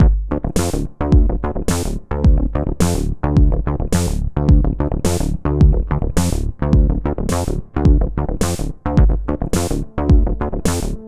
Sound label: Synth Bass 16 bars